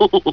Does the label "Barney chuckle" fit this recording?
Yes